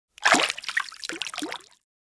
Bathtub splashing water movement

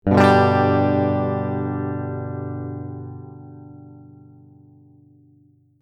Electric guitar G major single hard strum

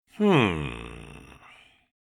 Old man thinking vocalization

